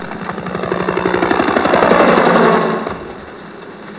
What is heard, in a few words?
flyby